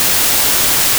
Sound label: noise